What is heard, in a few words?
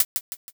hihat